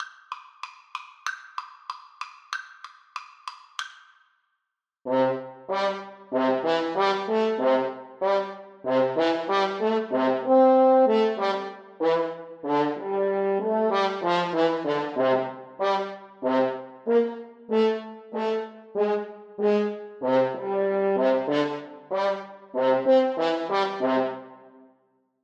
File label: when the saints, French Horn Duet